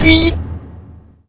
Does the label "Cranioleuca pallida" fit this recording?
No